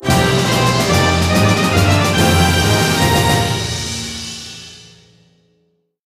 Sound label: Quest Complete